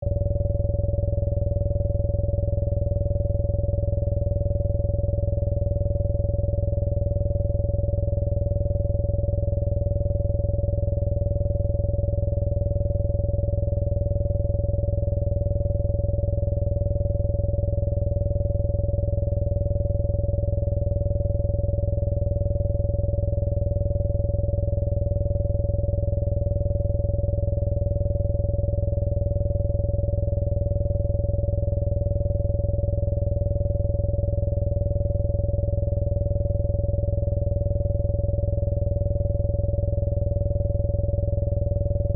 Drone Modulation, Scifi, Drone, Modulation, Flutter, Midrange; Science, Fiction